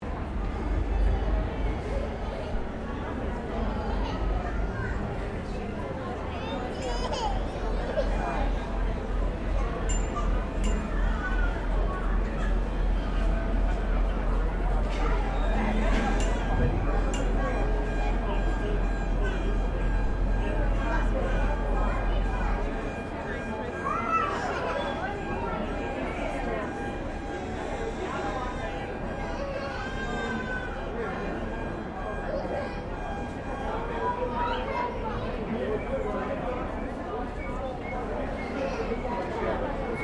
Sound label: Ambience Manly Ferry Terminal Crowd; Transportation Sound Effects; Transportation (Sound Effects:Backgrounds); Foundation1